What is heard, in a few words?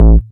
Bass A, Offbeat Bass; VEC1; Club